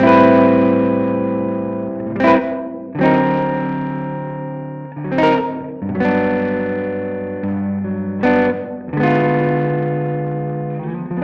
Rhy Gtr Lo Fi

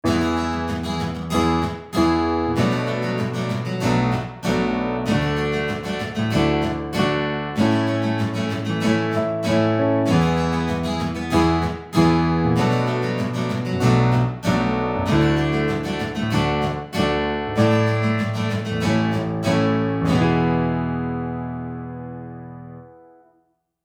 Keys support Guitar Strumming

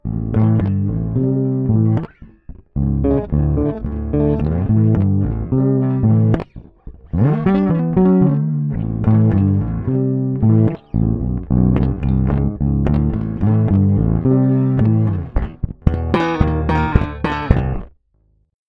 bass harmonizer

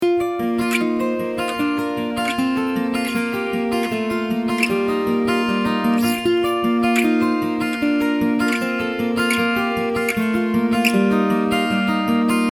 Standard Tuning, guitars; alternate guitar tunings

